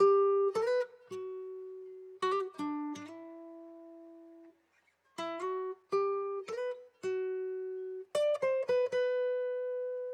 162 BPM E Min Guitar; Guitar Pack; Loop Stems; Cymatics; Miami Guitar Pack - Pt 1; 27 Club

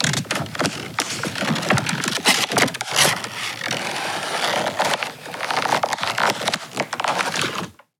Foley Cardboard; Loops; Ghosthack - Color Bass Essentials

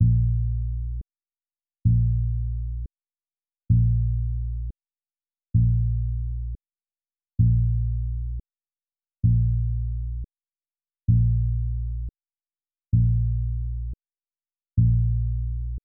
130 BPM A# Min Bass; Melody; Trap; Loop Stems; Cymatics; 2022 Melody Collection; Matador

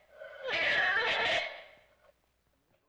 Raspy String Can Pull; Vintage Cartoon; Foley

